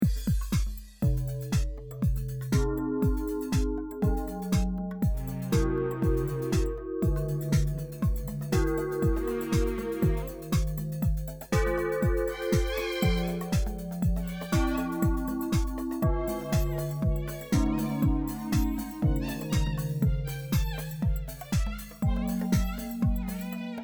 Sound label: dark bells; Pete Ippel; Clanging and the banging